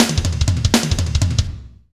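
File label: fast shuffle, Roland drum beats; Northstar - Drumscapes Roland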